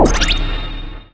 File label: Training Complete